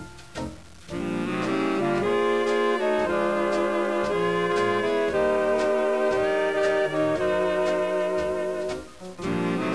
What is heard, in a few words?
foxtrot